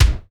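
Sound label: BD, drums; East West; Global Sounds; SPD11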